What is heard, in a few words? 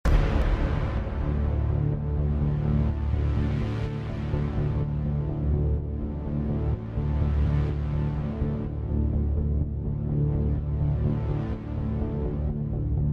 Raid Ambient; Battleborn; Oscar Mike